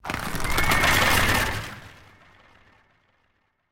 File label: alarm create cavalry; interface